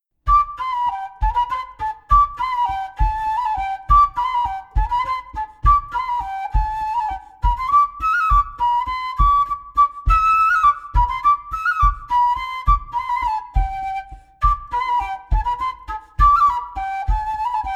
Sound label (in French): Montagnarde; Rec Tiersot; Marchatz marchatz petiota